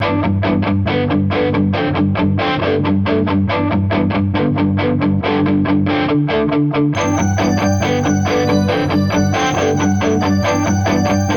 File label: Intro Pop Electric Guitar; AudioMammoth